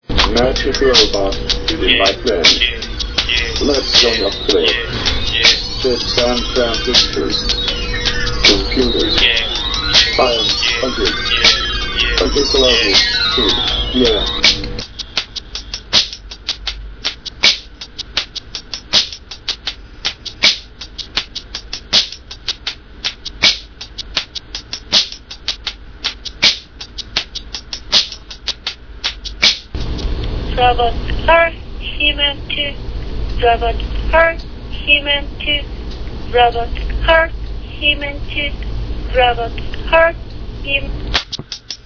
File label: beat